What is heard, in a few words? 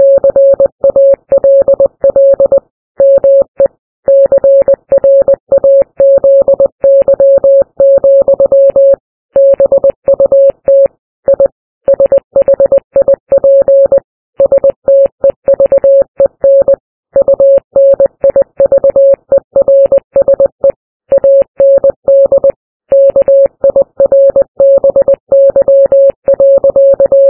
morse ship